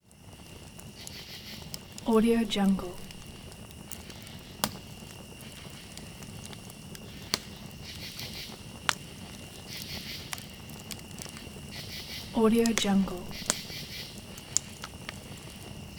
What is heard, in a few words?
campfire ambience